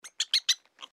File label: giantrat idle chirp; npc